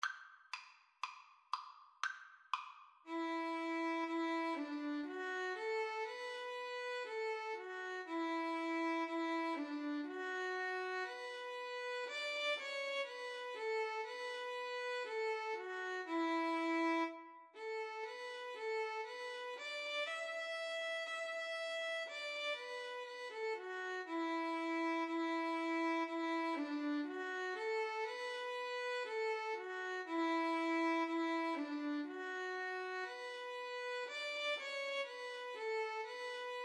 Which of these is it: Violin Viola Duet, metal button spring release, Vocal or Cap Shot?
Violin Viola Duet